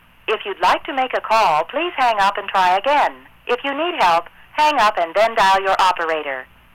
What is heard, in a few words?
Permanent Signal; recann